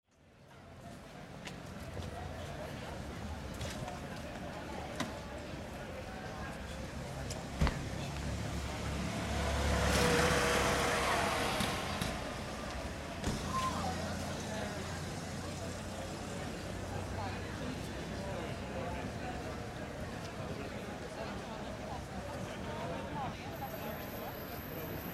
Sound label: Surround city ambience light evening Stereo Downmix; City Ambience Light Evening - Relatively sparse city ambience. Restaurant area, mid evening.; City Sound Effects